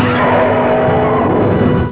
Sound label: death; alienarena; war